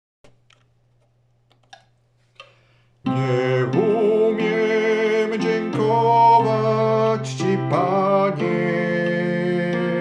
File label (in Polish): sopran